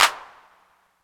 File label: claps 808; E-MU Formula 4000 Series Vol. 2; OrbitPercMaster